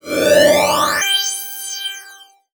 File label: Multimedia Internet CD-Rom Flash Hi-Tech